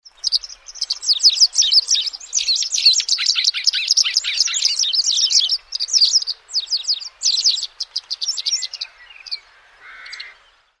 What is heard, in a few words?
pied wagtail